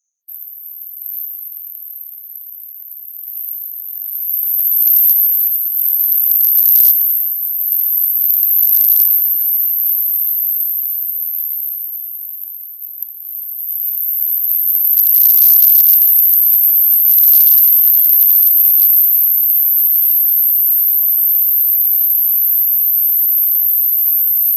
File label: 5500 to 7500 bandpass